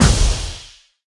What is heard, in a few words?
Vent Lift; Subway Surfers City; Android, iOS